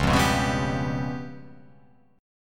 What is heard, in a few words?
C sharp 7th Flat 5th, chord